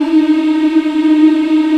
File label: VOX African; Roland LCDP11 Africa VOL-1